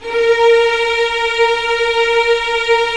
STR Violins, Roland LA Composer; Roland - LA Composer vol. 4; 90 s Sample CDs

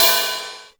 ZOOT MUTE, CYMBALS; CYMBALS 2

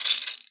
RAIN, PERCUSSION; Best Service ProSamples vol.42 - Session Instruments; PS-42 Session Instruments

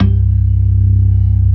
ORGAN, C3 PERC; Keith Emerson Signature Series

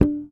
X NOTE, FRTLSS, Bass; Sampleheads; Will Lee Bass Library; F JZZ

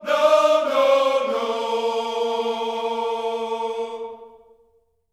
NO NO, Vocal Planet Gospel

